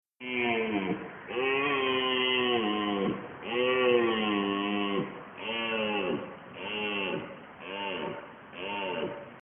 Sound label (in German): Rothirsch